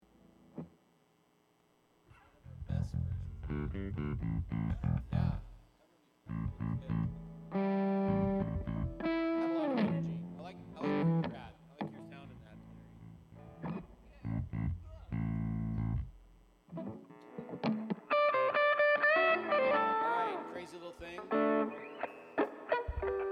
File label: Vocals